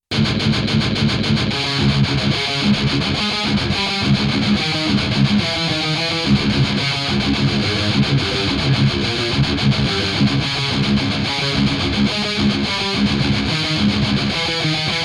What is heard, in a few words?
TS-9 Mesa Triple Recto (Stereo)